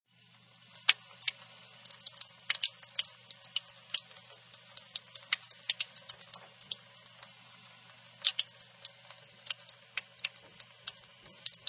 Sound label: Laundry Faucet, Faucet in large basin running and dripping.; Household